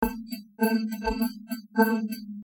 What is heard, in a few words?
morph drum